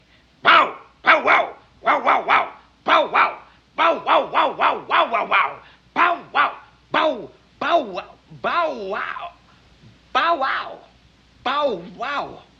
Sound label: bowwow